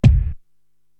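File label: bass drum Inner Ear, Korg TR-Rack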